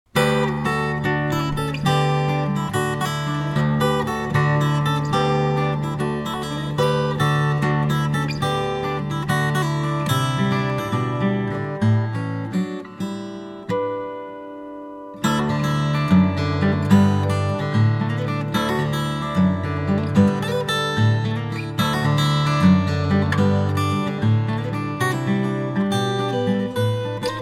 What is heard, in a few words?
string waltz